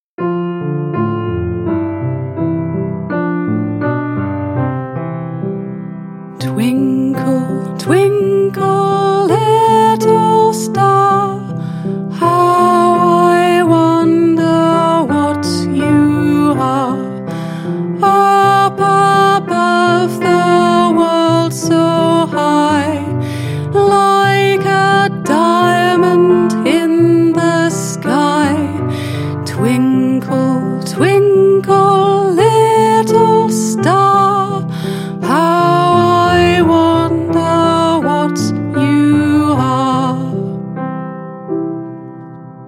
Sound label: Twinkle Twinkle Little Star